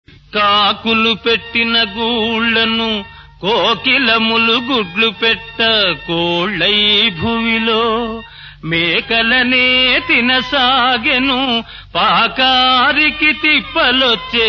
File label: Padyam; Appu-Chesi-Pappu-Kudu(1959); Kakulupettina